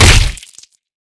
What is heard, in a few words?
hit; Garrys Mod